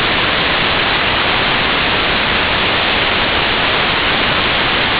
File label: noise